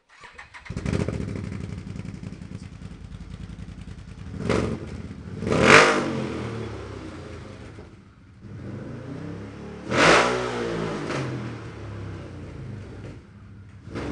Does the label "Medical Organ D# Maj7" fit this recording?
No